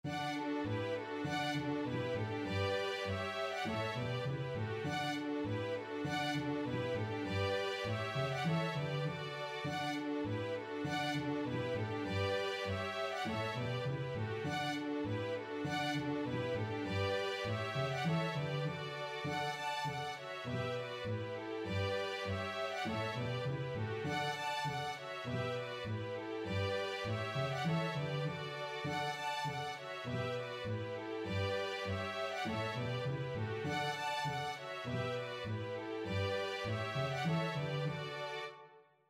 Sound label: Polka; Egans Polka